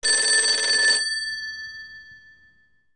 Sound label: Classic Ring; ZTE R90; RingTone